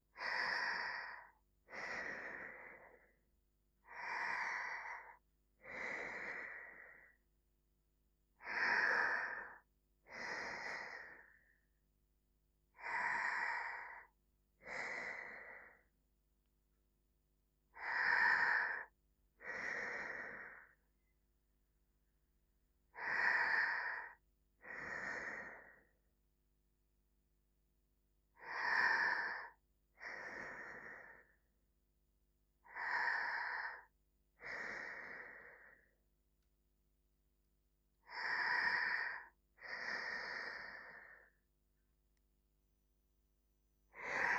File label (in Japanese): 寝息; 由真